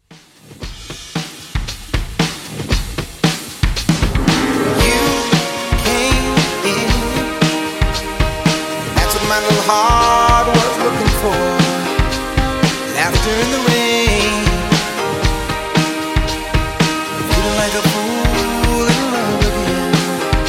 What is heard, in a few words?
90s Classic 115 Bpm